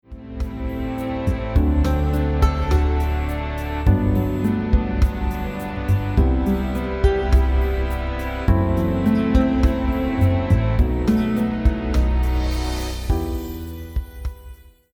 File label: guitar reflections